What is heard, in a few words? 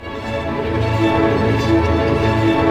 STR ARP MAJ, Symphony Orchestra, STR Arpeggio; Roland LCDP08 Symphony Orchestra; STR Arpeggios